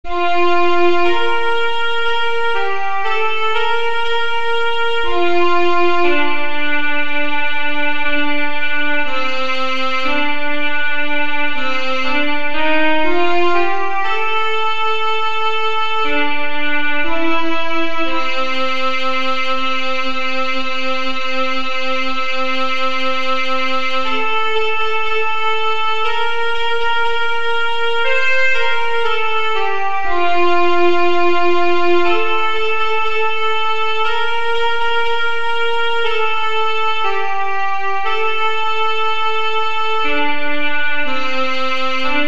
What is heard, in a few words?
alto sax